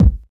dope kickdrum sound c key, kick drum single hit tuned to the C note